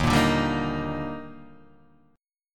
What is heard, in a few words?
D sharp Major 7th, Guitar Half Step Down